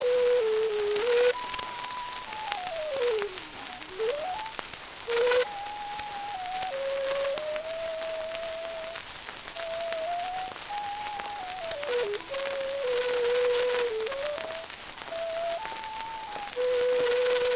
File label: 5 inch Berliner Clarinette; Variations; cylindres musiques